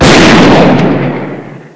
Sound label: explode